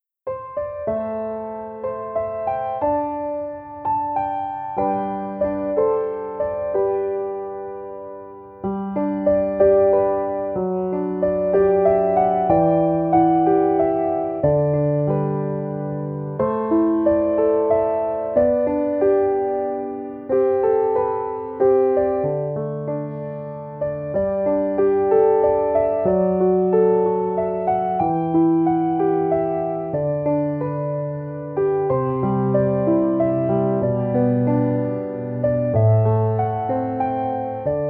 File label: so real melody